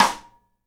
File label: SIDE STICK, Live Snares